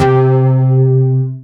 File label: BASS, C3; Zero-G; Chemical Beats; BASSES